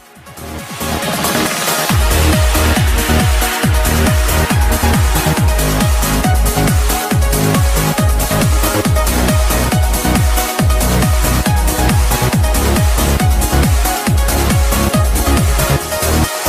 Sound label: Trance